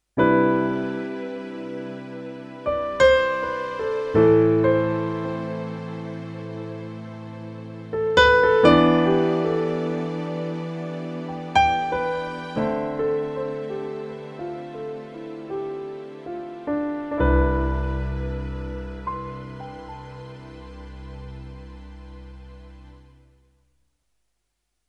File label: Piano Ensemble